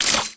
Basic Attack; orianna

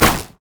satchel charge; LoL; ziggs poolparty

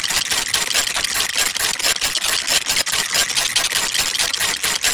chomp; LoL; jinx base

